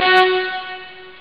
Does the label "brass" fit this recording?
Yes